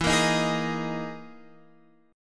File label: E Major 7th Flat 5th, Guitar, Open F, EM7b5 chord; strummed; JGuitar